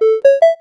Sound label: message, notifications; Hisense U820